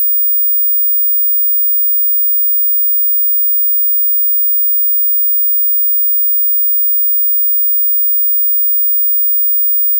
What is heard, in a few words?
15000Hz 75pc left